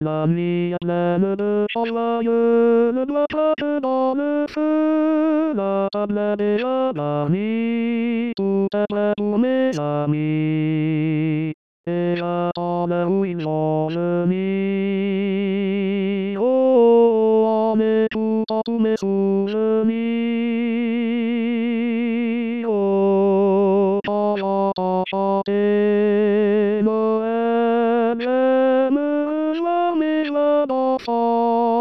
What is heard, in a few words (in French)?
Tenor